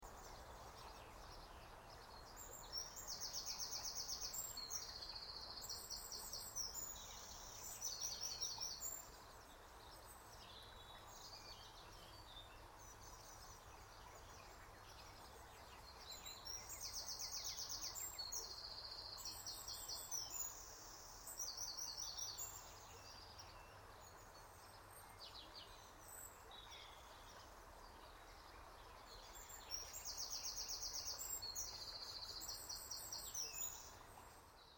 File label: birdsong